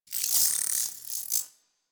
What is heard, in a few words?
Robo Fish Small Caught